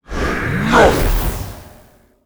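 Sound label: soul seeker attack, monster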